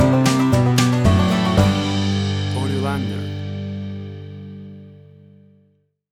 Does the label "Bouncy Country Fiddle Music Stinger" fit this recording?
Yes